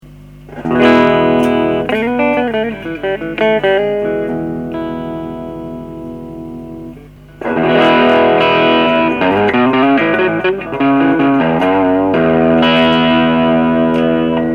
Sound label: lespaul